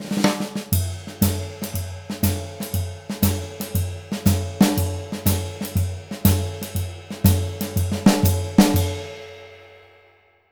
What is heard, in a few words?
120 SHUFFL; Univers Sons; Jazzistic